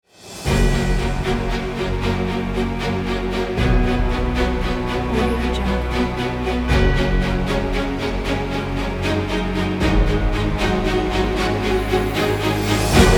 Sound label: award logo; edrecords